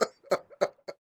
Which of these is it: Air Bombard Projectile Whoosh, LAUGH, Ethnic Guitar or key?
LAUGH